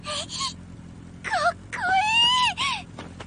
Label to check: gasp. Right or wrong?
Right